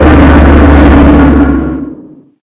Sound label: blind